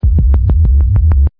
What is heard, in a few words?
juno, bass